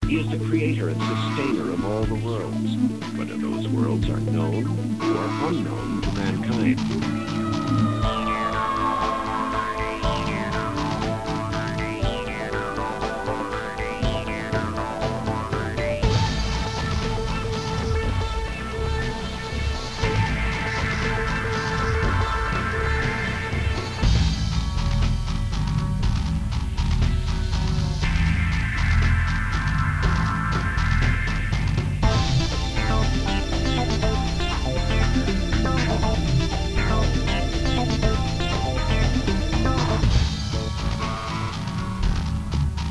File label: techno